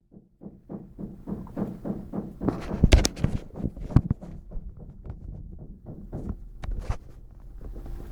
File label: hammer